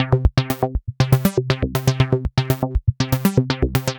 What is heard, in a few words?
Synth